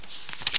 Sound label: pagetrn